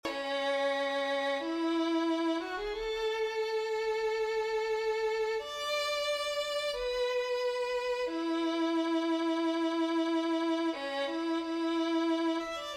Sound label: Violin 1